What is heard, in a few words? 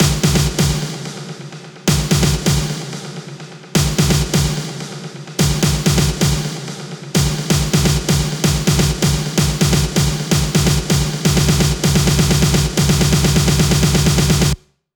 128 BPM; Megaman; 8Bars; Vengeance Samples; House Electro Fills